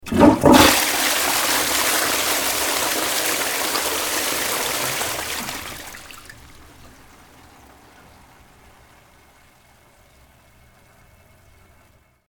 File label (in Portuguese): descarga efeito sonoro